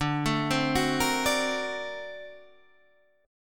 D 7th Flat 13th D, chord; D7b13 arpeggiated; JGuitar

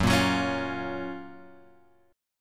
Gb Major 7th Gb Guitar Open G

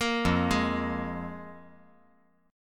F Minor Double Flat 5th

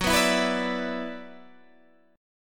Gb 7th Suspended 4th Sharp 5th, Guitar DADGAD; chordshape; down

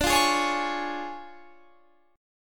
D Minor Major 7th Flat 5th, D, Guitar Standard; 22,20,18,18,21,21; DmM7b5 strummed; JGuitar

